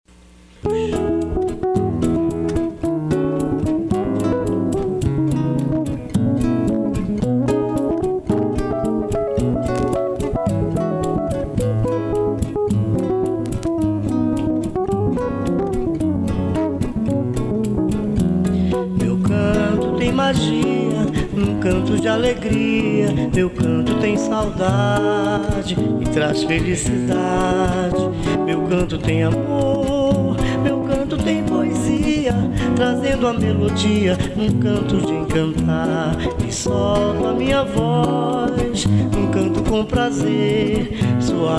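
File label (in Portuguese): canto mágico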